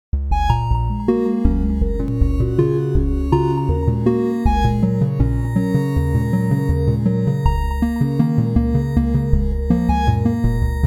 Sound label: Sonification Untranslated ends